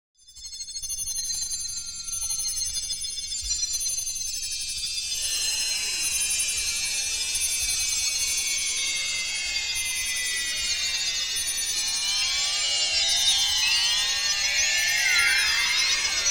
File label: noise voice